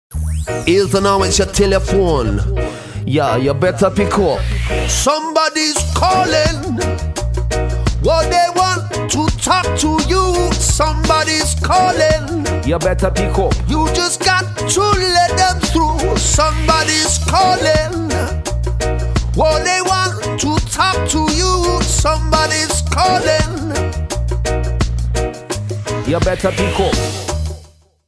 Dub Reggae

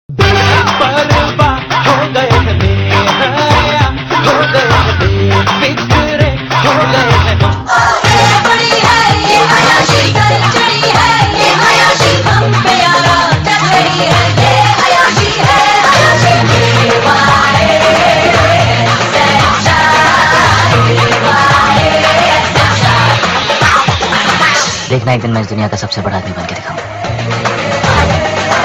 Tone; Badmaash

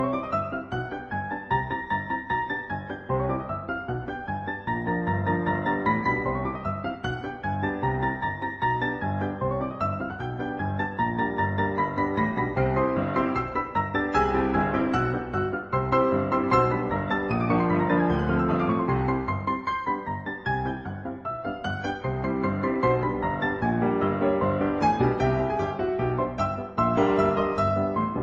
Wild West Music

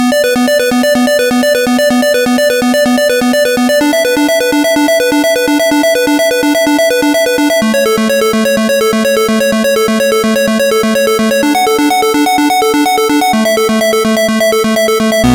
Electro

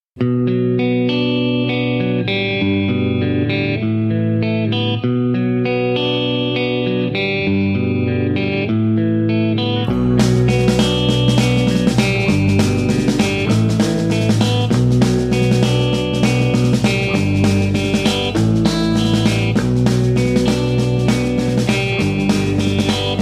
Rock

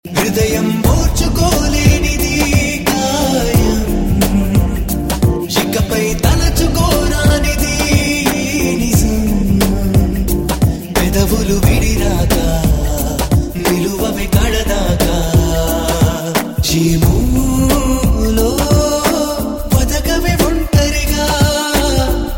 Solo

